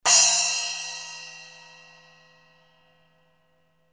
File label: 9in Custom Hybrid Splash, cymbal